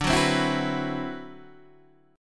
D 13th D, down, Guitar, DADGAD

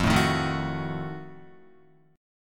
F 7th Flat 9th F down Guitar; F7b9 strummed

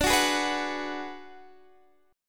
D 6th Flat 5th, Guitar Open D

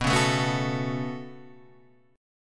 B 7th Suspended 2nd, Guitar, Standard; B7sus2; strummed; JGuitar

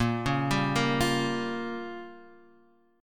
Bb Minor 6th, guitar; arpeggiated; JGuitar

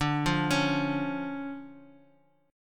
D Minor Major 7th D

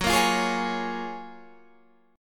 Gb Major 9th, down, Guitar, Standard; strummed; JGuitar

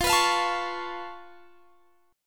C sharp Augmented 7th, F

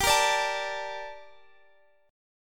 G Minor Add 9th; strummed; madd9:1,b3,(5),9; x,22,20,19,22,x

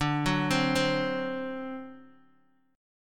D 7th D Guitar DADGAD

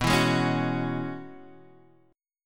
Gb 7th Suspended 2nd Suspended 4th B

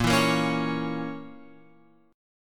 A sharp Diminished, chord; A#dim Chord {6 7 8 6 X 9} strummed

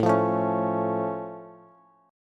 A Augmented A, Bass

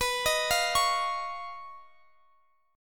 Eb 7th Suspended 2nd Sharp 5th, B

